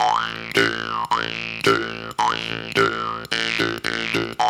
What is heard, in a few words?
108, African Contemporary; BROWN; Spectrasonics; Supreme Beats